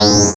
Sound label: ring modulation, pocket calculator; pocket calculator 24